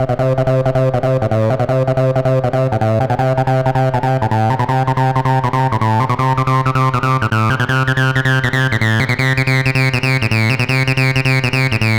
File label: Hardcore Loops Acid Loops, Dance Synths, 160; Best Service ProSamples vol.37